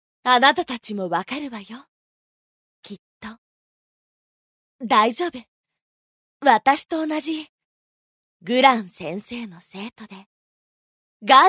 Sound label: effort